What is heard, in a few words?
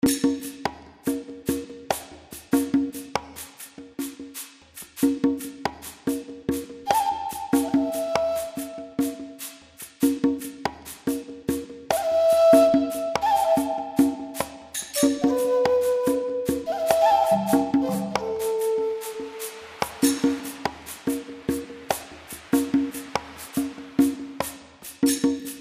world conga mystery